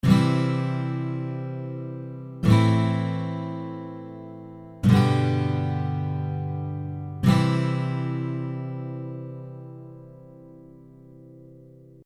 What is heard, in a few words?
Gt CAGC